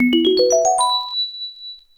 Star Road Unlocked; Wii

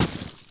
Crab

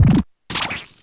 Monty Mole Appear; New Super Mario Bros. Wii

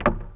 Rotating Log

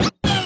Yoshi Jump On; Wii

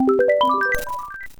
Start Level; Wii

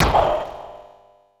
Smack; Super Smash Bros

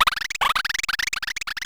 mini bugs; Gnat Attack; Super Mario Paint

